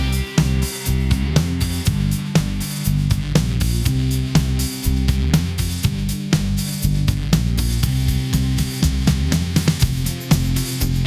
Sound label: energetic rock; ai